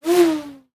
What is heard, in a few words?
Throwing snowball